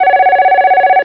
phone

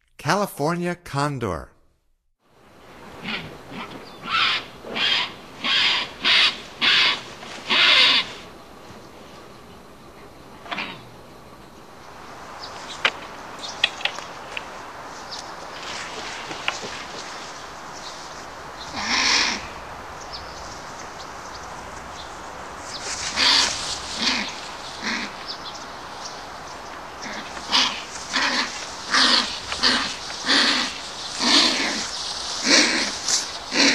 California Condor; North America; Stokes

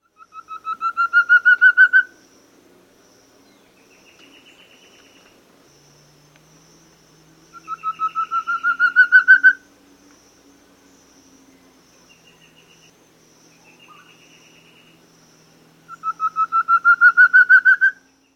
Thicket Antpitta; S. America

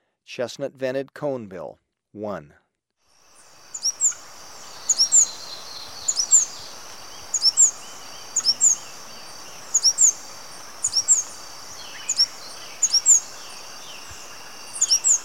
Chestnut-Vented Conebill; S. America

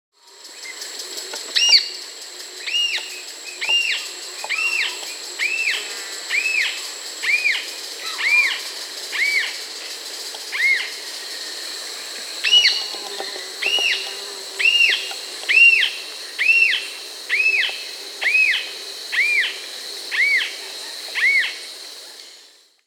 Paradise Jacamar (Galbula dea), Peru; S. America

